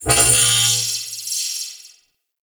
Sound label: Guardian Shield Pulse; Warframe; Eximus